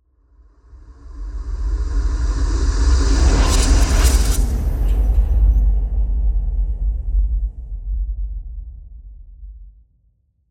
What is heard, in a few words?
Just Transitions Creepy; soundbits